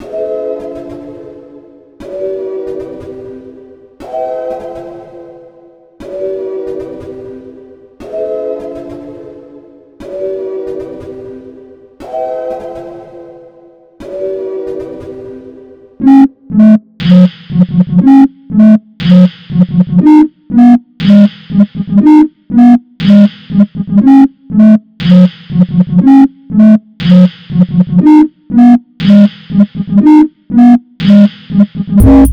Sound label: sine wave trap beats